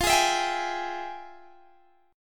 F Minor Major 9th, Guitar Open D, chord

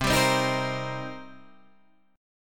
D sharp 6th, C

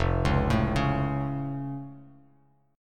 F Augmented, Bass Standard; arpeggiated

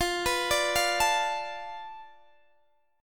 F 6th Flat 5th F down Guitar DADGAD, chord

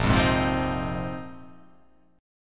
A sharp 7th Suspended 2nd Suspended 4th

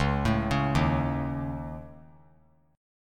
C sharp Suspended 2nd Sharp 5th C sharp; C#sus2#5; arpeggiated; JGuitar

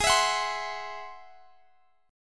G sharp Augmented 7th G sharp

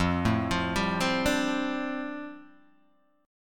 F 6th Add 9th F down Guitar Open D, chord

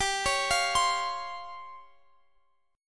C sharp Major 7th Flat 5th, chord

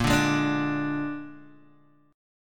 A sharp 7th A sharp, Guitar, Open D

